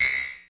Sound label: glass smatter